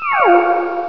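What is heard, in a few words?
down whistle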